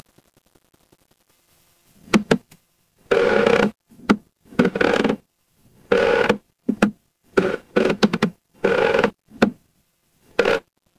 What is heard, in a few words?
horror creak